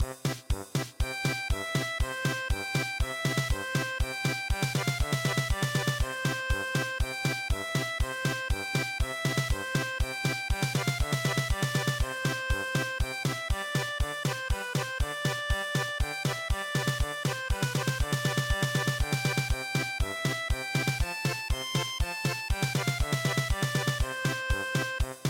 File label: German Polka